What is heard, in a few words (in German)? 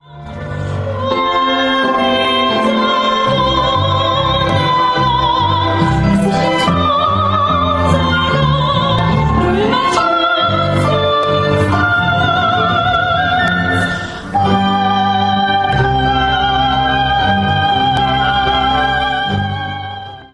Gesangsprobe; My Fair Lady